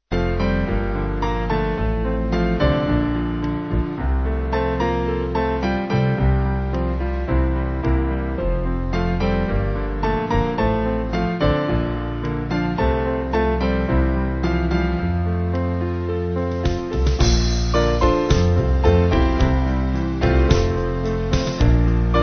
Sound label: Band